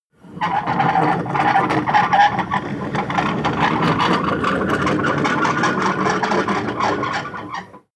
slide wooden door squeak

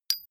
metal impact tiny toppy; hits explosionen; metall

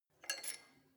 weight bolt pull out; sport freizeit

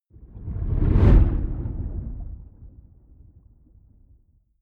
whoosh abstract low

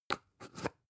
spice jar big scratch close soft; industrie wirtschaft; gastronomie

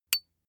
small metal impact medium high; industrie wirtschaft; metall

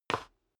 step sneaker wood creak slide short; schritte

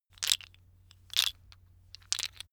tiles wood clatter; objekte; holz

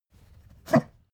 pug gag smack short; natur-tiere; hunde

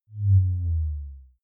bass drop simple short

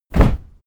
cardboardbox soft

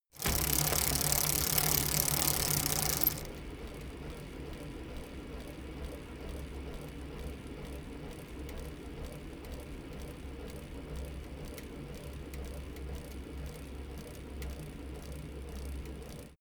bicycle rickety chain pedal roll short

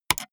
gaming mouse left click single fast hard; maschinen; buero

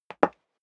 step high heels wood parquet single stair down; schritte

